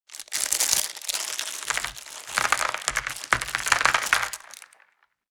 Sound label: board game pieces dropping into board; Monopoly